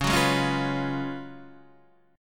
F sharp Major 7th Suspended 2nd Suspended 4th, C sharp